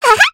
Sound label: laugh evil; voice packs